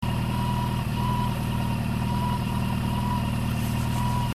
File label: idle, cans